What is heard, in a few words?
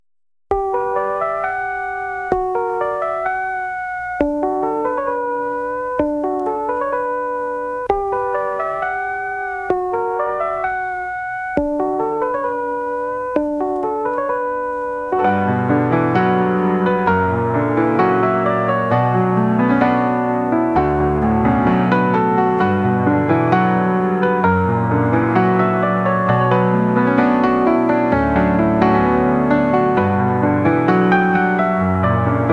8 Bit Piano